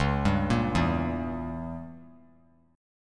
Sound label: C sharp Diminished 7th down, chord; C#dim7 arpeggiated; JGuitar